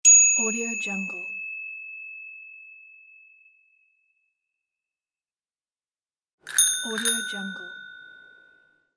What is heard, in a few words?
bell